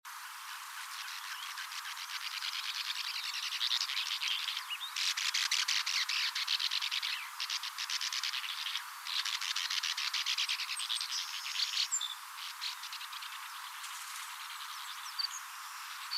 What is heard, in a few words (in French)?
hirondelle de rivage, riparia riparia